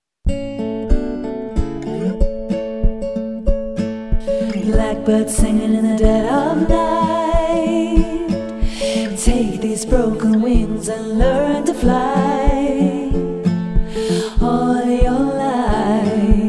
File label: melody